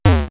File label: collision; InteligentnySaper